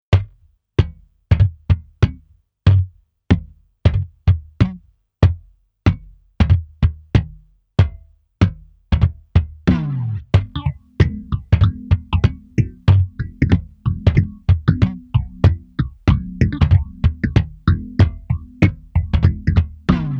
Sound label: tone demo bass